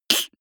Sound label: beatbox snare clap